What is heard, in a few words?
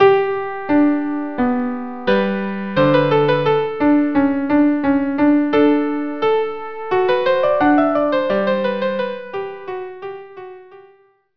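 violin viola adagio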